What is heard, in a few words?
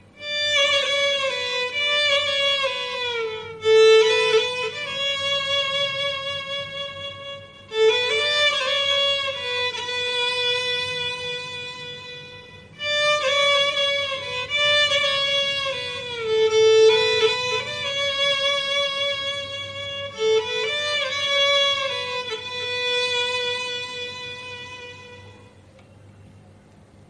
Violin Instrumental Music Ringtone